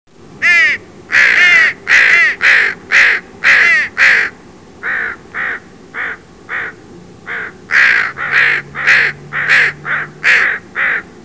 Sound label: crow group